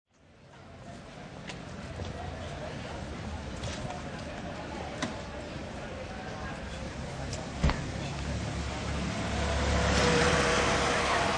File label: Crowd City Ambience Light Evening, Relatively sparse; city ambience. Restaurant area, mid evening. Occasional car